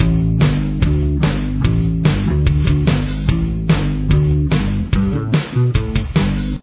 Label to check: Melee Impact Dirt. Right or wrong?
Wrong